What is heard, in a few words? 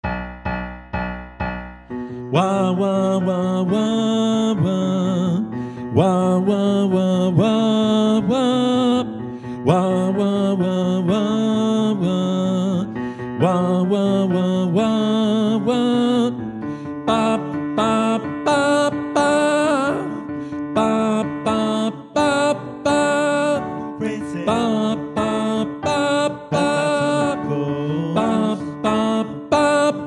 tenor